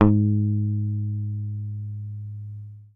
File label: HOF SUS G#2, Bass